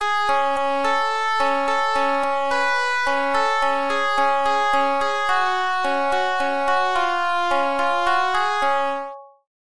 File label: organphone